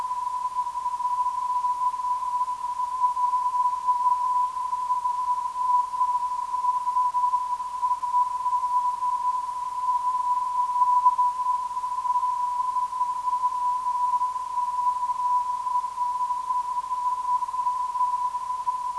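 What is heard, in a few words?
390kHz, non-directional beacon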